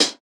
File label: Hi-Hat; DP COLOR TV